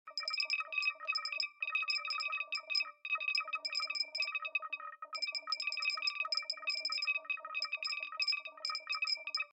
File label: computer processing sound